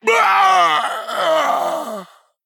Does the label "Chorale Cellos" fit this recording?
No